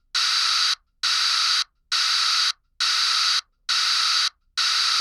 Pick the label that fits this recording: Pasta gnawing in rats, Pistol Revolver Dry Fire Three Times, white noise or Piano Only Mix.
white noise